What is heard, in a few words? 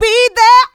mixed vocals; Houseworx